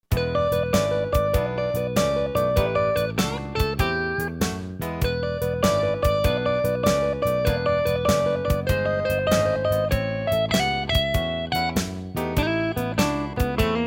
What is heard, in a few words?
Blues Solo